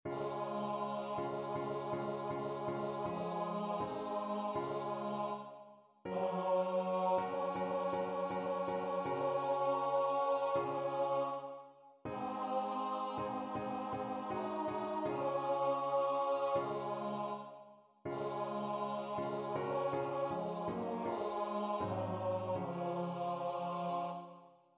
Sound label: Tenor; God Be with You